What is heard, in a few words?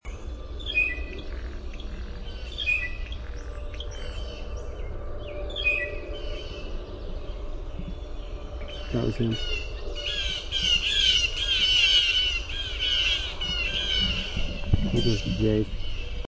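jeer pumphandle rattle, blue jay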